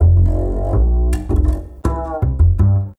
Standup Bass, Drum N Bass

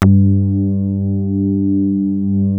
Prophet 5, G3; Hollywood Edge - Giorgio Moroder Rare Synthesizer Collection

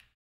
HAMBONE; ILIO; Vocal Planet VOL-2; Blues & Soul

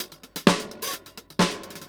Funky 16 127, Beat Loops; Zero-G; Monster Beats

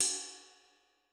Ride, Cymbal, Yamaha DTXpress-II

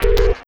Zappy Kick; Sonic Foundry (Sony Creative Software); Esoterik Beatz; Zappy Kit

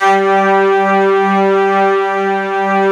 ST-ORG, STRINGORGAN, Yamaha VP-1

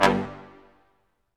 BRS Quintet staccatos, Symphony Orchestra; Roland LCDP08

